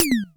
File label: HIT DOWN; Q Up Arts; Dream Experience